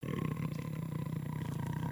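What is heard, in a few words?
Sabertooth Idle; creatures pets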